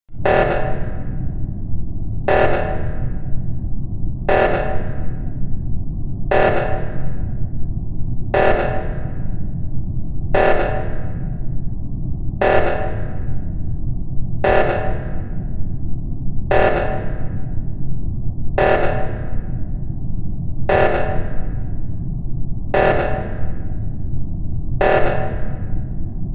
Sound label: full alarm; among us